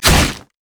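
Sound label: armadillo basic attack; rammus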